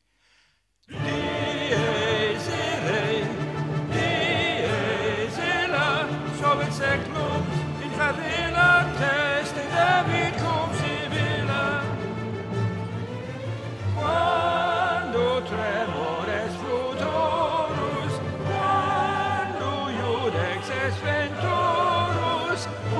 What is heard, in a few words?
soprano requiem dies irae; Mozart Requiem